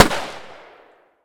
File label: gun tikka t3 battue 308 Swpph Shot Strong